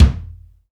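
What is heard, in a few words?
kick, Roland; Club-50 - Foundations Roland; Ext.Snare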